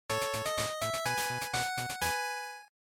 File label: Shooter Clear, bgm